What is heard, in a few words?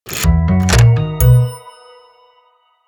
SONIC LOGO; Kelly Boutilier